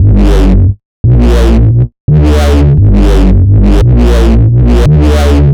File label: Mob Bass, Drum n Bass; KJ Sawka; Drum n Bass & Beyond